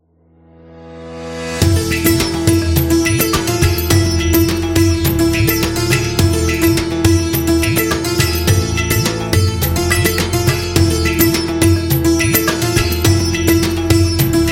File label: India, travel agency